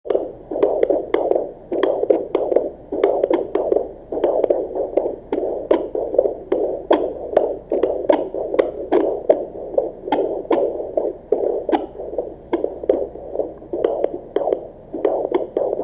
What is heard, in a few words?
2nd degree AVB